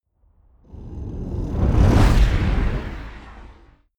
sci-fi vehicle take off growl; raumschiff

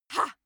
female attack hah

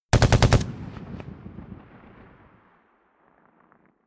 rifle ak-47 exterior fire burst big; Firearms; schusswaffen

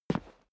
step boots single concrete single stair down; Footsteps; schritte

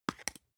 spice jar smooth open; industrie wirtschaft; gastronomie

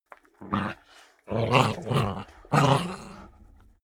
chihuahua snarl fight; natur-tiere; hunde

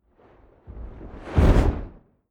fire whoosh fade in; swooshes-swishes

